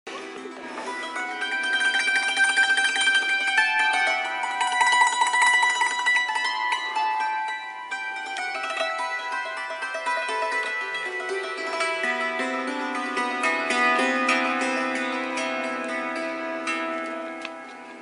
tympanon, musique frappees

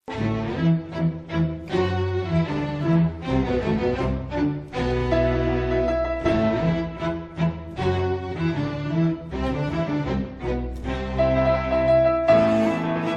lion son, carnaval animaux; l'extrait 1: le lion

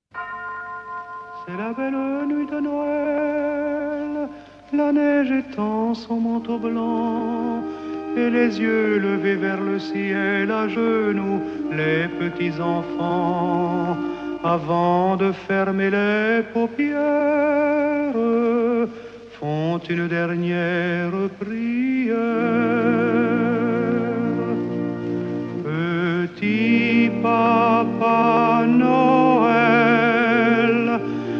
chants; chants de Noël

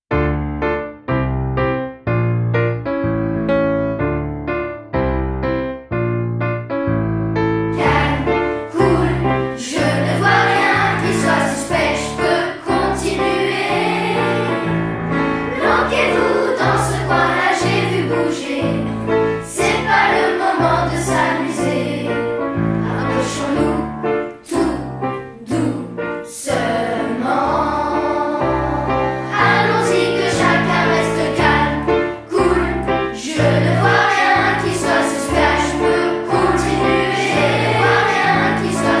l'inspecteur mène l'enquête chant

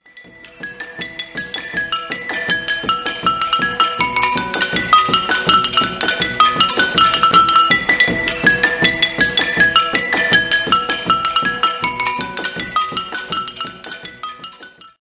musique, instruments insolites

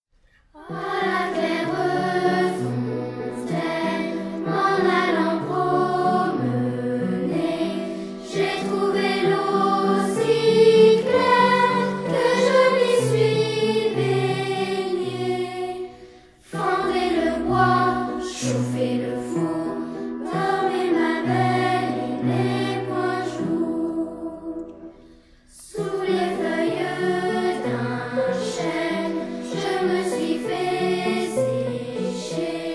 a la claire fontaine quebec voix enfants; oiseaux en musique

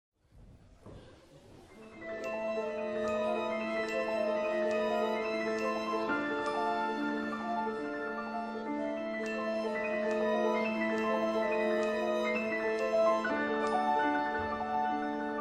aquarium son, carnaval animaux

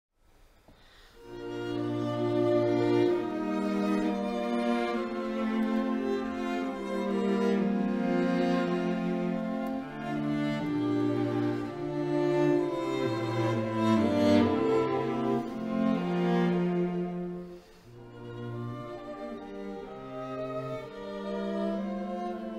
quatuor a corde; frottees

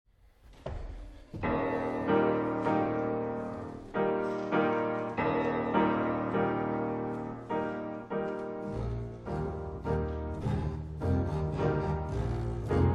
elephant son, carnaval animaux; l'éléphant

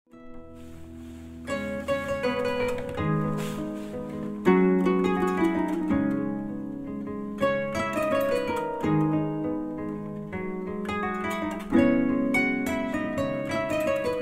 kora; pincees

